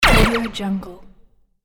cartoon character laser hit

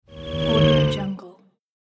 cartoon vehicle pass by

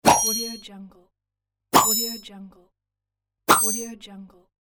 pop bell whoosh

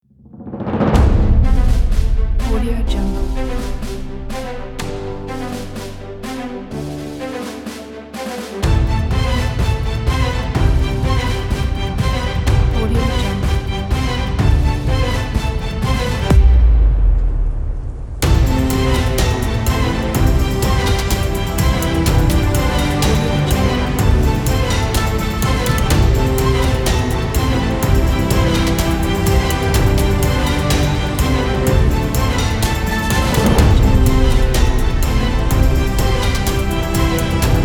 heroic intro

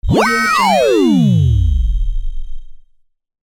laser pulse

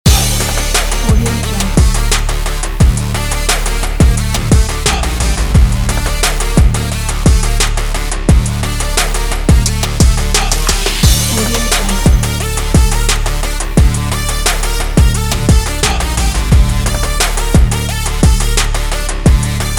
trap sport action loop; studioproject

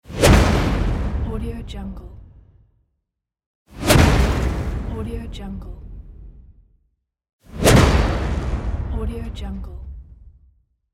swish impact

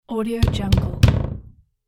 heavy door knocks; lucifugum90

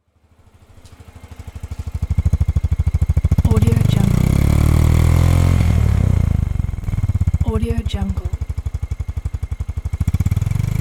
motorcycle engine; jet-fx

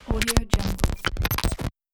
glitch; bogdansfx

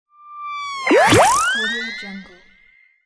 animation sound; volkovsound

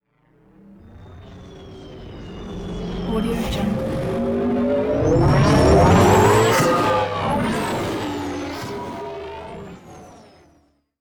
wave warped ships

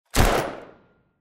desert eagle pistol fire shot

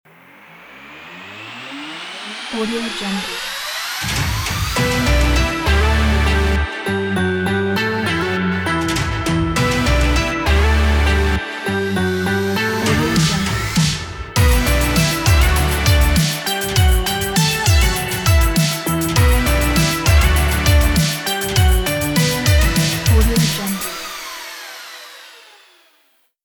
happy moment of life

